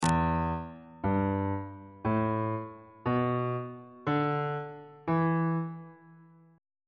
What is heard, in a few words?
e minor pentatonic scale on bass clef